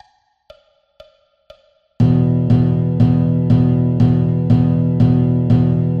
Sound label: Rhythm example, blues